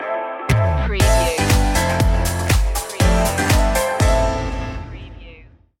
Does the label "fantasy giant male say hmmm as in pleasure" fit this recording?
No